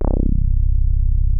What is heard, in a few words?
FAT BASS, BASS STATION; Syntec; Wall of Sounds